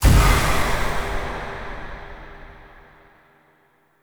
Trick Ghost False Hit; Enemy; Abilities; Characters; Circade

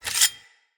Dagger Equip; Weapons; Dual Daggers

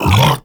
Regurgitate Cast C; Warframe; Grendel

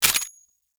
Pistol Reload Slide Pull; Warframe; Weapons; Tenno; Lasrian

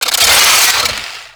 Drone Blade Jump; Warframe

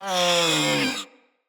Feral Vocal Death; Catbrow

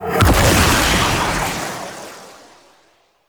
fire spear radial blast; Warframe; Nezha

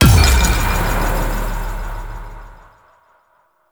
Hit React Quick; Warframe; Duviri; Dax

